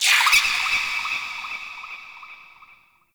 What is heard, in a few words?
Hallow Spirit Vocal Death; Halloween